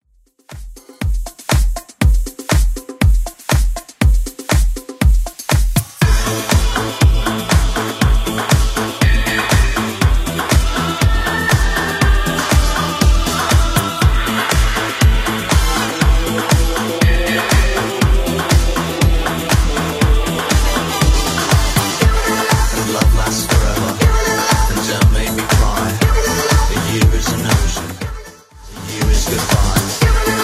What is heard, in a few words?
80s Club Edit 120 bpm; remixplanet